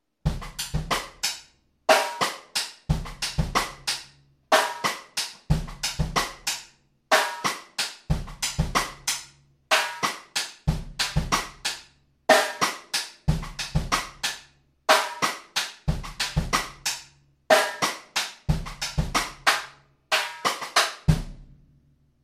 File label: Rim knock groove